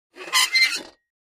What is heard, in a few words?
Metal Scrape On Metal